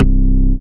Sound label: DM ORG1, Dance Mega Synth